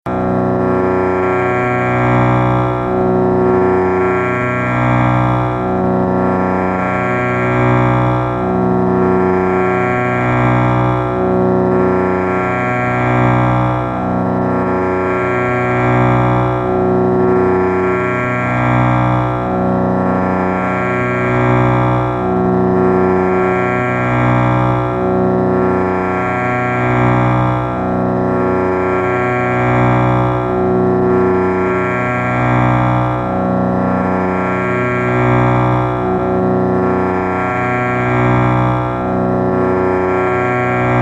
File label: Sruthi B